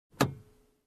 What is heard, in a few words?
Car interior compartment click